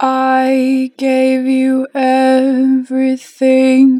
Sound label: Gave - 2 bar vibrato, Vocals, Natural Vibrato; Harmor Vocals